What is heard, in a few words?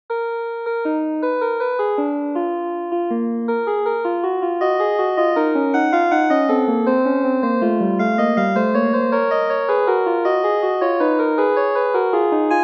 prelude meantone